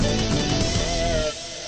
winning, sfx; BALLY; CORVETTE; Son Corvette Original

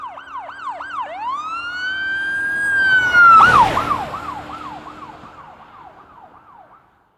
failed; BALLY

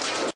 glass broken; sfx

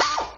twizz, sfx; BALLY

